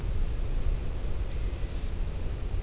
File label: blue gray gnatcatcher